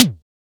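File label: SDS V SNR, snare; Best Service; Real Mega Drums VOL-1; MONO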